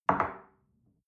espresso place on table soft, restaurant cafe

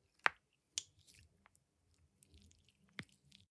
gel dispenser press squish, badezimmer; haus garten

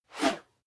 whoosh whistle

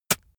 spit; soundpool

